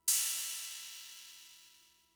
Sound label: Cymbal, Fricke MFB-522, Drum Machines